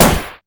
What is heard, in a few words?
Staff Metal, Damage